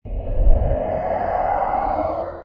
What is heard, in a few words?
ghost; creatures